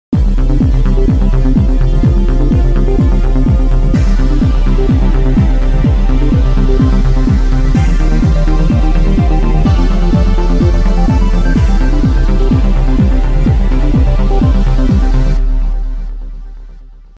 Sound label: Pad